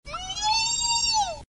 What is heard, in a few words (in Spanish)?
Grito; Aromatisse